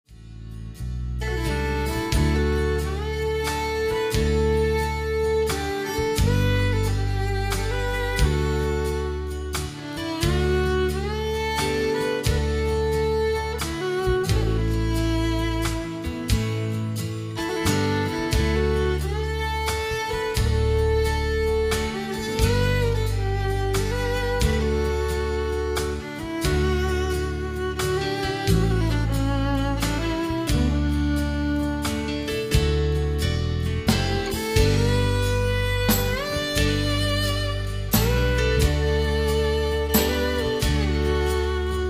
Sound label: Country Waltz